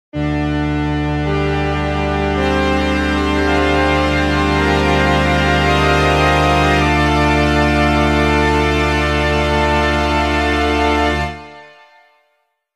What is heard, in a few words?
String